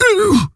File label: Stonebark Wound; Elder Stonebark